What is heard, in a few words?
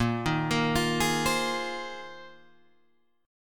A sharp 7th Suspended 2nd Suspended 4th, Guitar, Open G